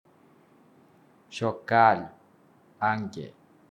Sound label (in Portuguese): chocalho